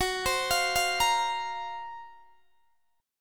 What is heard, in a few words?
Gb Major 7th Flat 5th, down, Guitar, DADGAD